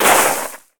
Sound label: step skid